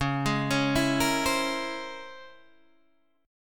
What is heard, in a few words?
C sharp Minor Major 13th, guitar chord